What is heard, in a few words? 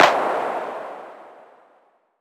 CLAP